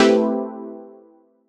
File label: trance supersaw stab chord